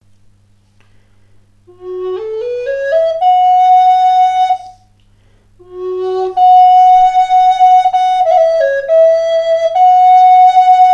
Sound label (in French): Son flute amerindienne fa noisetier